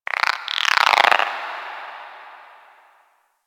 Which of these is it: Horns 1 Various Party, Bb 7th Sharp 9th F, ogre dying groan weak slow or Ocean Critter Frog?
Ocean Critter Frog